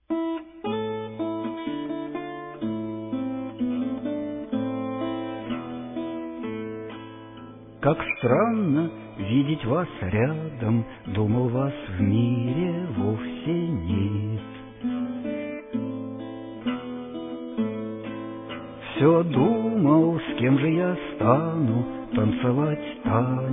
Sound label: menuet